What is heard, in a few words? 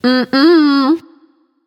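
disagree, female; smite; voicepack; hera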